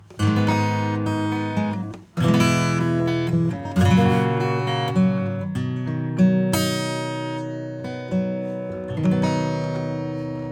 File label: Acoustic bypass